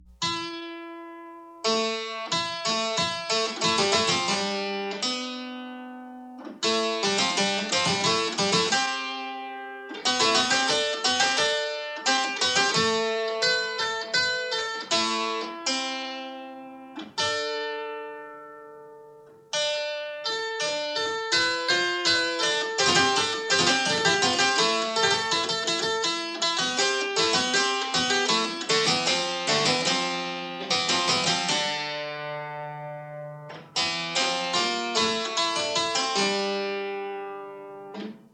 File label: Earliest keyboard dance from Robertsbridge Codex